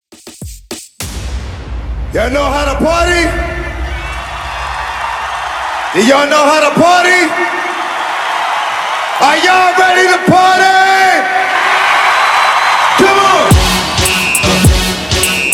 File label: Drop Intro 102 BPM